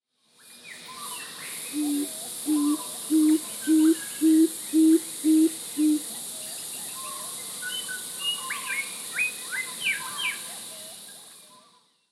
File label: Superb Fruit Dove Ptilinopus superbus superbus